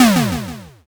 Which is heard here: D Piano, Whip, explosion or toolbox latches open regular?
explosion